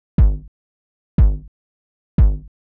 hard 909; hydrogen

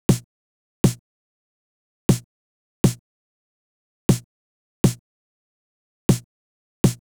909 snare; hydrogen

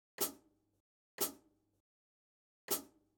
Pedal Hat; hydrogen

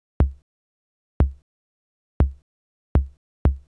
kick; Denon; hydrogen

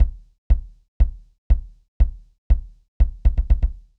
Kick; hydrogen

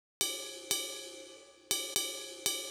Ride Jazz; hydrogen

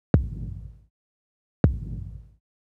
Kick Nz; hydrogen

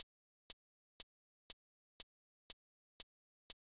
77 chh; hydrogen

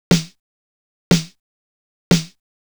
626 Snare; hydrogen

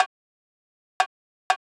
Bongo High; hydrogen

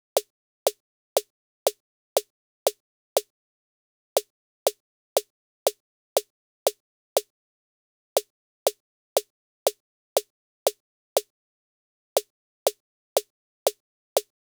snare; hydrogen

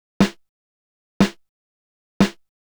626 Snare; hydrogen

